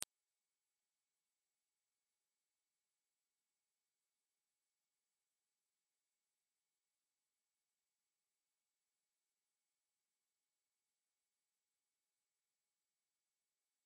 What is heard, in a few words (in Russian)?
viskij ultrazvuk